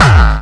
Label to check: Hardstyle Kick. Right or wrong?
Right